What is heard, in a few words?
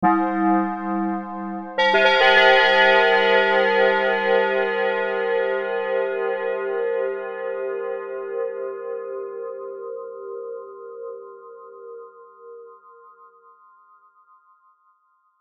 Bells Of Bedlam